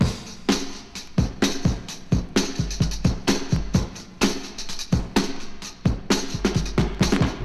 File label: 129 bpm fresh drum beat F key, Free breakbeat - kick tuned to the F note. Loudest frequency: 2687Hz